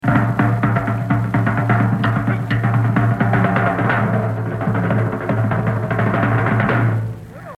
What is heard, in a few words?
Solo; Lionel Hampton; The Evolution of Jazz Drumming (Gottlieb)